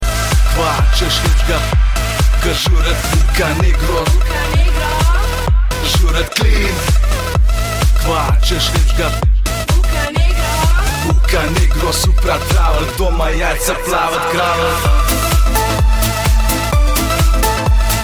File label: house music